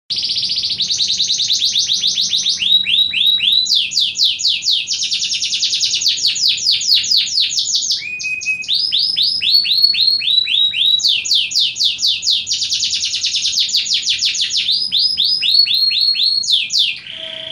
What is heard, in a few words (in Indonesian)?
kenari; leo